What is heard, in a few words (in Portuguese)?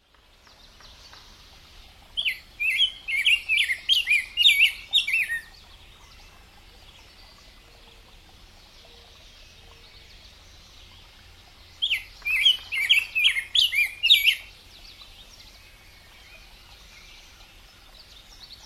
canto do bico grosso de peito rosa